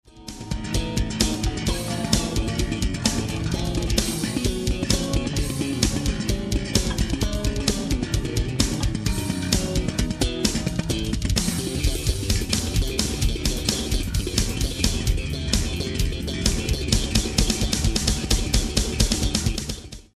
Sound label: bassline